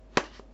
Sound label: effect place cards